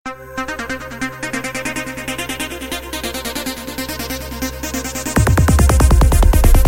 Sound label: trance ringtone